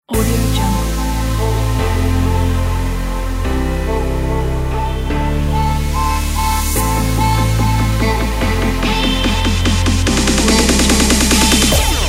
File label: future bass; zigzag-sound